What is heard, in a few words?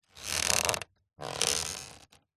foley basket hamper picnic lid open or close movement creak; zapsplat